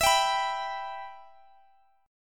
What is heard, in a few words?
E Minor E down Guitar Open G